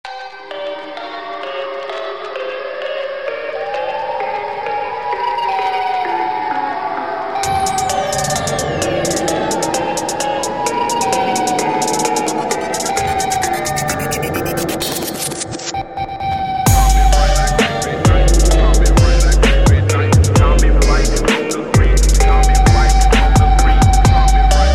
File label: Siren Head Phonk